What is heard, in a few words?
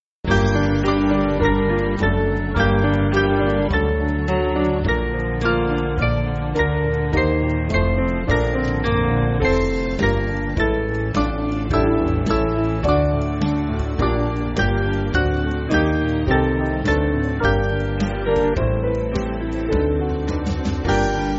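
Band, Backing Files for Churches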